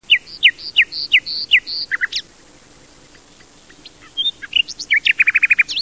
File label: luscinia megarhynchos